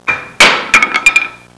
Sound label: FALLING; HAUSHALT